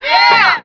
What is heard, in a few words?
"yeah", group; scratch